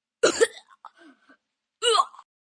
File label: Choking Gagging, Choking, vomit, 1 child, gagging, dry heaves, grunt, human voice, kid; Vomiting